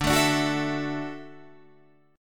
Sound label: D-Major Add 9th D